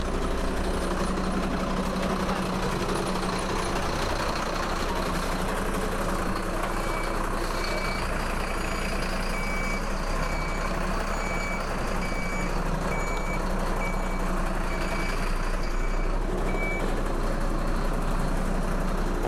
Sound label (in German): LKW fährt vor- und rückwärts